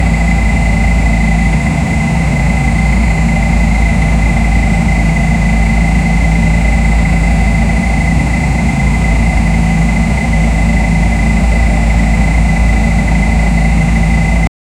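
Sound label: HMF performance ATV exhaust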